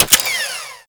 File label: Miter Mech; Tenno